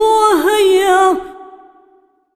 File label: SINGER, Arabian Traditions